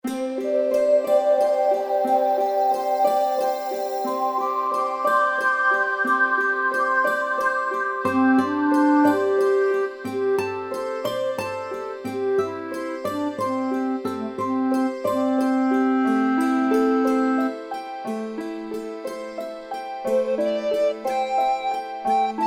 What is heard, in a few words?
backing; instruments together